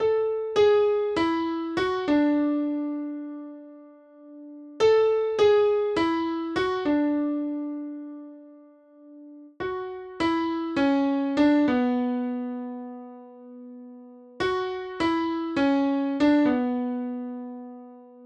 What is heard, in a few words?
Piano Amin